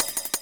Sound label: hat; Breaks N Beats Sound Kit; Beatz Kit